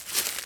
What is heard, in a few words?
leaves step; Golden Crayon Sounds